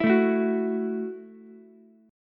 Gb Minor 7th Gb, Ukulele; strummed; 9,9,12,9; JGuitar

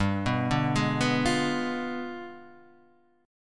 G 7th Flat 9th; arpeggiated; JGuitar

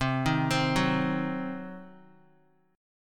C Diminished, Guitar, chord; arpeggiated; JGuitar

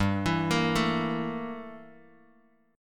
G Minor 6th Add 9th G down Bass Standard; Gm6add9 arpeggiated; JGuitar

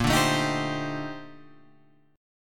A sharp Major 13th A sharp

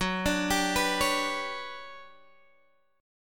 G Major Sharp 11th F sharp, chord

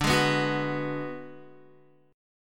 D 6th Flat 5th, Guitar; D6b5 strummed; JGuitar

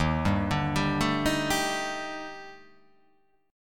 D sharp Major 7th Flat 5th; chordshape

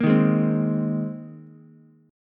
C Minor 7th Sharp 5th, Ukulele; strummed; JGuitar

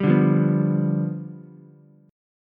G 7th G down Ukulele; G7 strummed; JGuitar

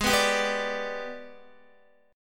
Ab Minor Major 7th Flat 5th, down, Guitar

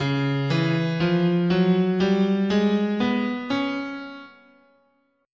C sharp Mixo Blues, scale

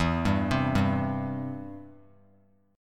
E Minor Sharp 5th, chord; Em#5 arpeggiated; JGuitar

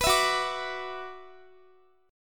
F sharp 7th Suspended 4th Sharp 5th, Guitar; strummed; x,x,21,21,18,21; F#7sus4#5 Chord

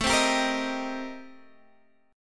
C Minor 7th A; Cm7/A; strummed

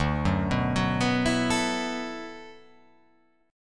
D 6th Add 9th D

